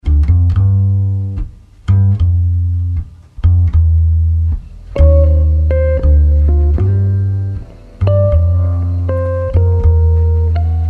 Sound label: double bass